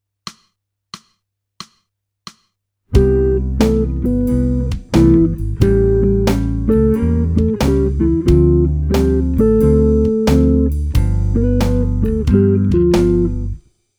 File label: jazz